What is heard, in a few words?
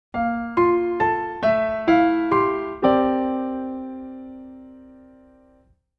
jingle